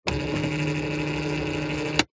office electronic typewriter paper feed motor no paper brother ax 450